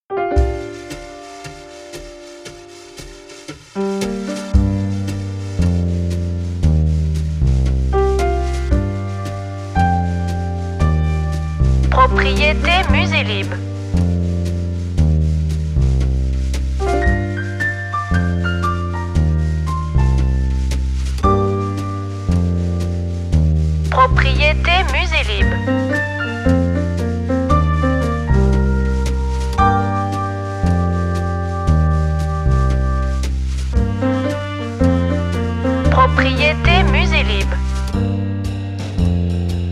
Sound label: rain on the window